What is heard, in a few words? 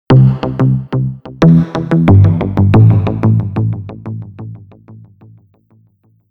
Jingle; TeamView